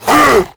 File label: attack grunt, zombie; creatures